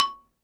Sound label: XYLOPHONE, Percussion; ILIO